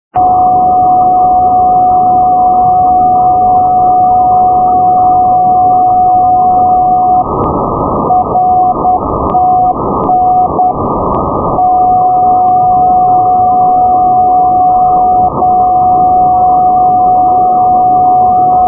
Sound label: ndb romorantin rtn 301; paris; europe